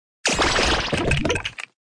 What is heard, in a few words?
explosion; magic maid cafe